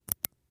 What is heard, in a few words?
Buttons